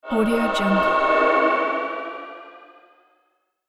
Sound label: flashback fantasy